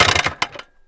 bezuspeshnaya popitka zavesti neispravnij motor

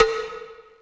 koubel odinochnij v prostranstve

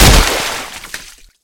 letnee pleskanie v ozere

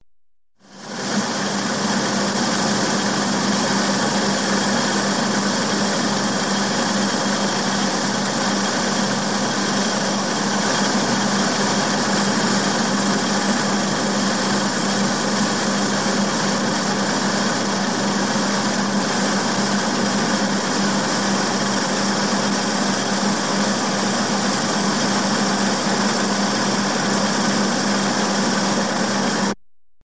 tabachnaya fabrika proizvodstvo sigaret rabota stanka

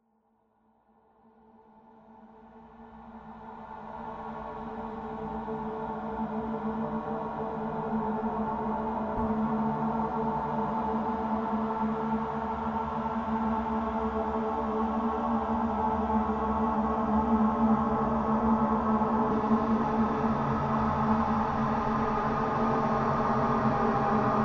krovavoe ubijstvo okruzhayushaya sreda atmosfera

